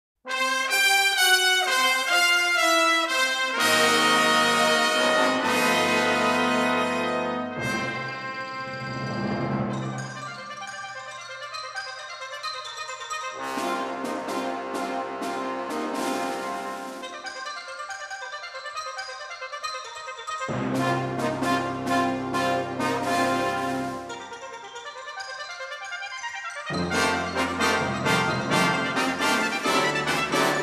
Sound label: brass